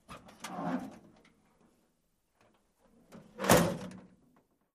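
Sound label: 1936 Ford Door Open Close, ford truck old v 8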